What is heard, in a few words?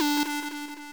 sub lead d3